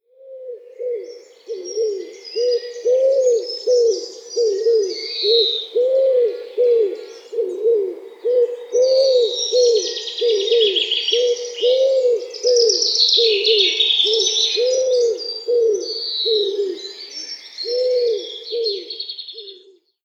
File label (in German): ringeltaube, vogelstimmen